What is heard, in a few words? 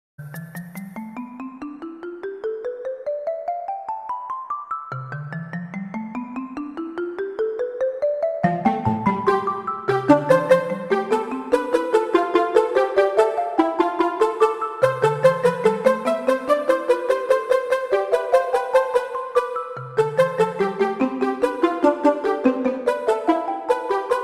log Fe O Ratio Scaled Orch